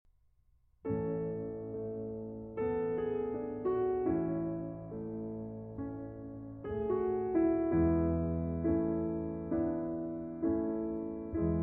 Piano Sonata No. 9 in F-Sharp Minor, Adagio Non Troppo